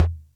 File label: Music Percussion Computer Tom, Drum Machines